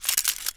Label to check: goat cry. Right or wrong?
Wrong